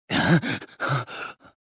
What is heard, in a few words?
gasp; Star Wars Jedi Knight II Jedi Outcast; XBOX; bartender